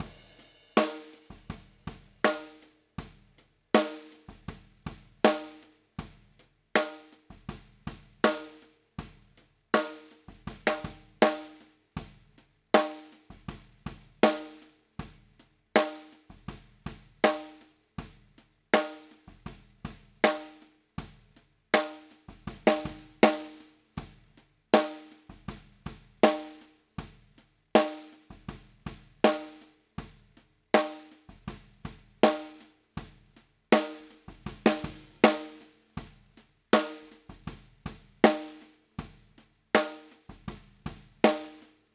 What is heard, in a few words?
Snare Bottom